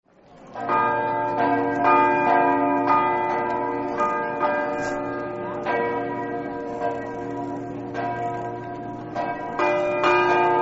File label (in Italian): campane